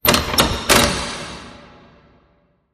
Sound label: Door Lock